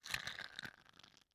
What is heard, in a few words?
Health Cocoon Move; Hollow Knight